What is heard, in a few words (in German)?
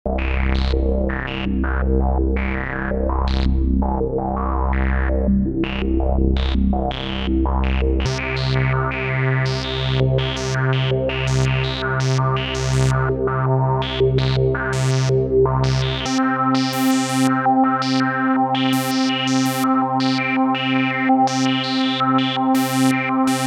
Klangvergleich CAT wet